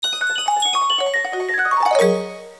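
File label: Ice Bin Rainbow Sprinkles fill; Fisher Price Ready for School Kindergarten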